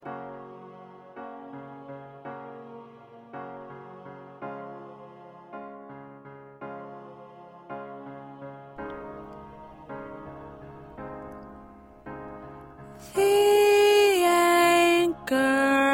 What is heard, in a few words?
Lead